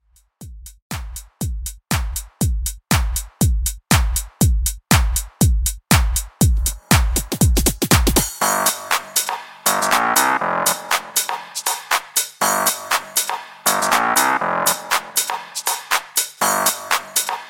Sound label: 90s Club Extended Mix 120Bpm